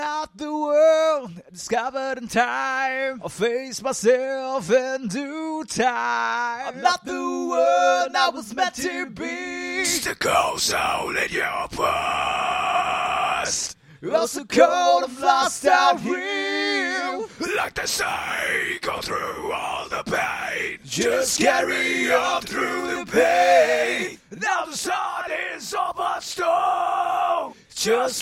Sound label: heavy metal hard rock, Acapella, 122, Fm